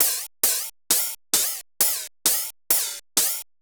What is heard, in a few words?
DE TUNE HAT; Drum Kit; Hats; Crowdsourced Sample Pack